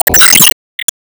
Enemy Fashion 1st Hp Damage Shot

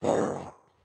Chop Wind Up; Caffiend Caelan

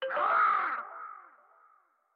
Attack; StyxMech

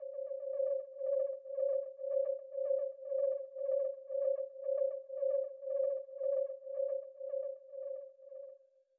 Boss Hover Loop; Going Under; Winkydink

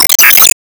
Enemy Iceberg Crystal Delete; disney star smash android ios mobile gamerip 2020

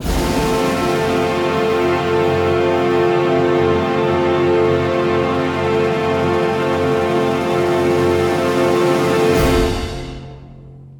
Classical; test drive unlimited solar crown; xbox series xs

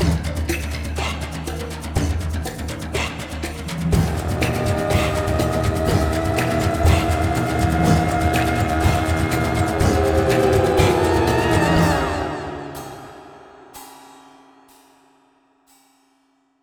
PostVictory PERC; GRINDSTONE

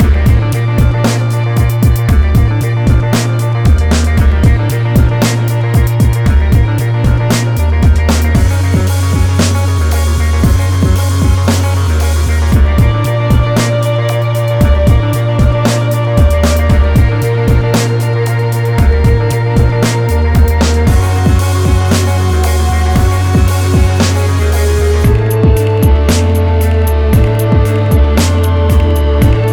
GROUP LOW ACTION

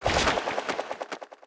Enemy Big stamper Fly

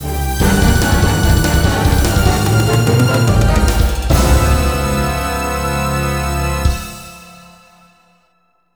Game Start (Arranged)

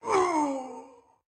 Pain; Caffiend Caelan; Pain Normal

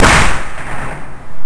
Clap; Guitar Rock Tour

